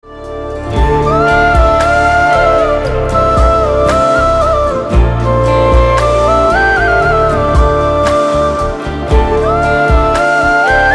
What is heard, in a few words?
Instrumental